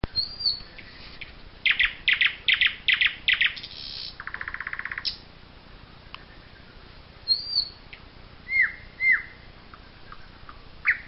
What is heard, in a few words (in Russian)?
penie solovya; shum prirody